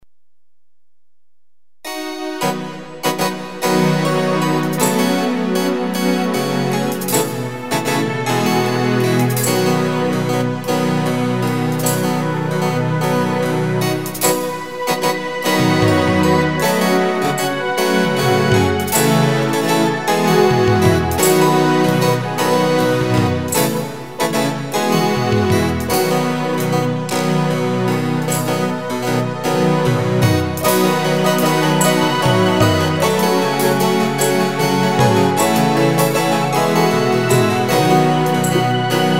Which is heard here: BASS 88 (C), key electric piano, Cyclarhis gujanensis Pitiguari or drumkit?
drumkit